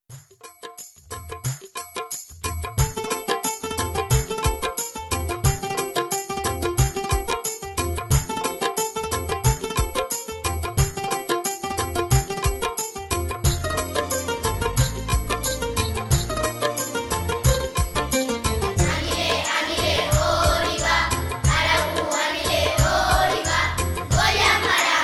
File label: ANILE, ANILE ODI VA. llengua tàmil; Sud Africa